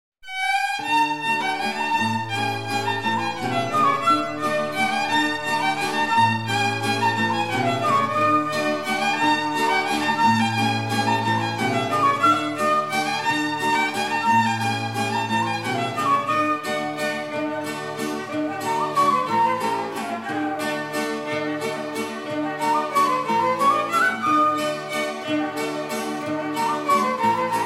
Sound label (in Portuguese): Folclore